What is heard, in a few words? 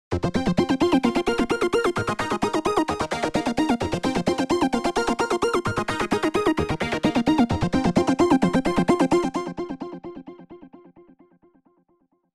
Verb - Arp Synth; OldSkoolVerb